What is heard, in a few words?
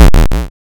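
waveform FM square sine envelope silence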